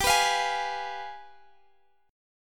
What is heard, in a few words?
G 7th Flat 9th, Guitar, Drop D